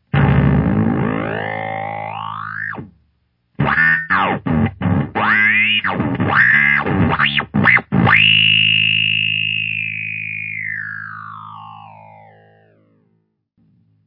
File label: bass ring